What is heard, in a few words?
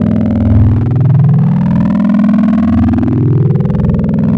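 shield Gen active loop; Star Wars Clone Wars; XBOX; Xbox Live Effects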